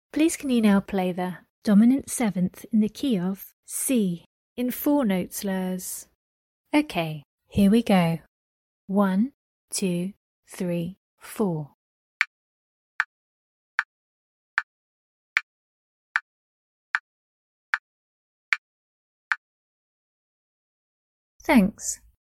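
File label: dominant 7th key of C 4 note slurs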